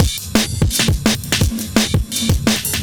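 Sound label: Drum and Bass Beat 170, drumnbass 170bpm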